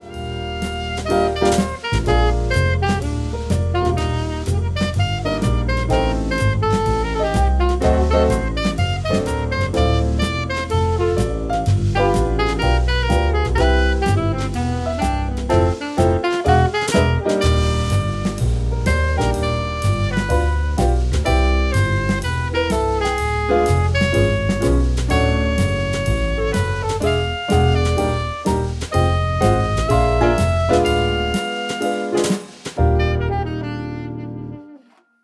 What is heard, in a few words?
Jazz